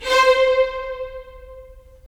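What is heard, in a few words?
strings violins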